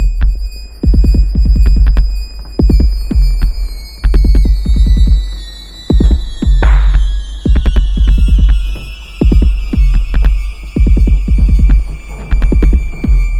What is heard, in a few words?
techno